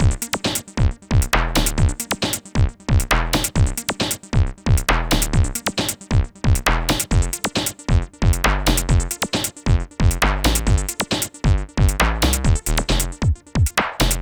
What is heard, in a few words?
Hardcore Breakbeat, 135 Gmin; Big Fish Audio; Hardcore Breakbeat Action; Ave A